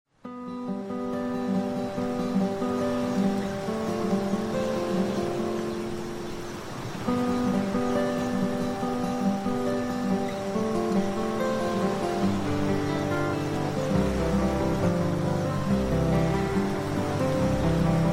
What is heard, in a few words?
HAWAIIAN MUSIC Instrumental Relaxing Hawaii Songs; KAUAI BEACHES; Luau Aloha Spa Musik ocean